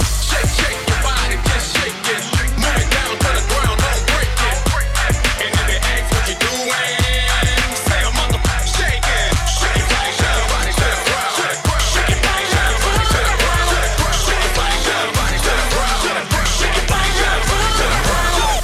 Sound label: funk; multitrax